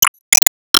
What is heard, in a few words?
Bonus Balloon; disney star smash android ios mobile gamerip 2020